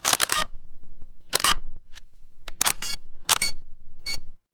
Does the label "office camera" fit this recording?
Yes